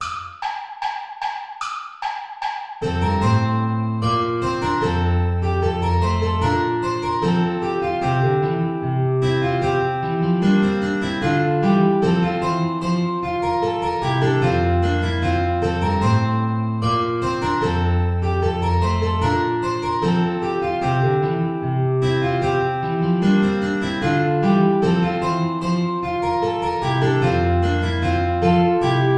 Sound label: play along without guitar; praetorius michael; ballet des baccanales